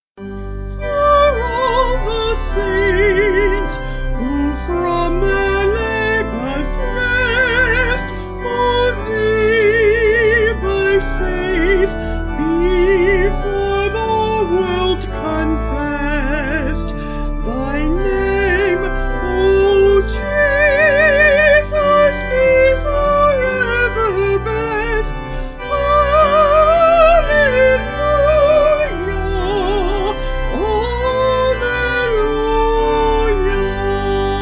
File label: Vocal Organ